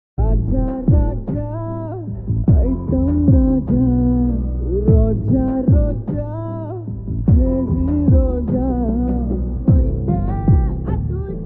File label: lofi Telugu ringtone